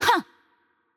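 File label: Attack Light, Korean; bloom minigame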